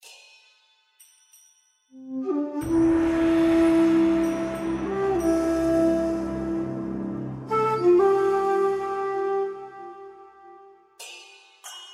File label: bg music; Wild Koi; Eyecon